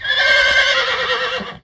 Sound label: unicorn; kabloey redux